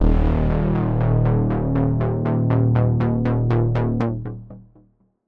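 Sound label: analog D#2; analog server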